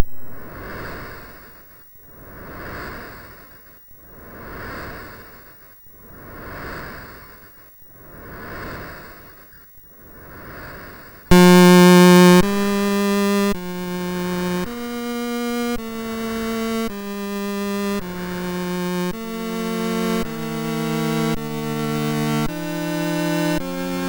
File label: Beach, MOS6581R2